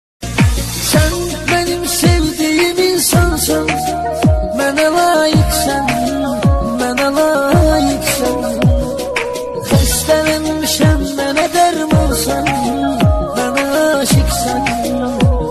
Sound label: Very Nice Ringtone Turkey Ringtone